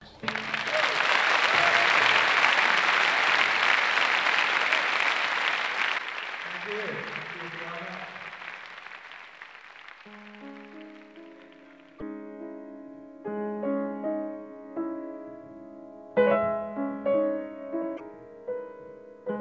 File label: Piano Music